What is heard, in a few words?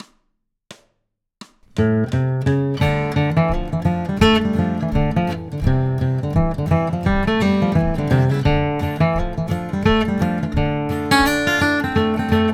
lonesome fiddle blues, guitar